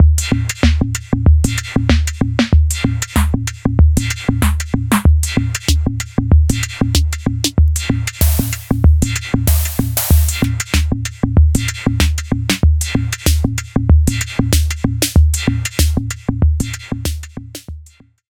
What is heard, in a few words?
Backstage Gong Snare Presets; D16 Group Audio Software Nithonat 2